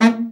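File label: baritone, BARITON SAX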